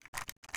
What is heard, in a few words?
dual draw, weapons; Dystopia; duals